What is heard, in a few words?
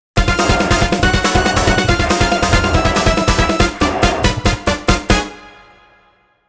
Mission Failed; Assault Suit Valken